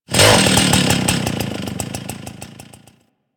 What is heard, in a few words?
Ghoul Sawman Rev Ambient; Warframe; Grineer Ghouls